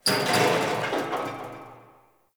Garbage Can Rattle; Warframe; Ambience; Nineteen Ninety Nine

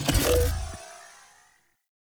Hack UI Start; Warframe; The New War; Narmer Hack

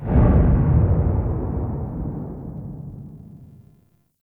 Big Hit; Warframe; Ambience; Nokko Colony

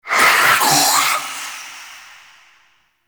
Life Screams; Warframe; Dante

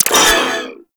Mech, Weapons; Warframe; Cephalon

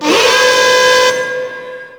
Paratrooper Hack Alarm Loop; Warframe; Lasrian Soldiers

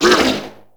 Corpus Struggle; Warframe; Amalgam Override

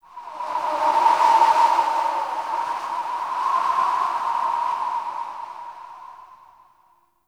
Whistling Wind; Warframe; Tau

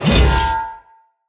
Whoosh, Swords; Mockery Sub Boss Stultion; Enemy; Weapons

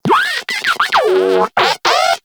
Moa Compassionate Vocal Greeting; Pets; Warframe

